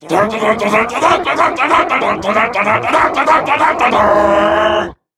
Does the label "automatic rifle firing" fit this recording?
No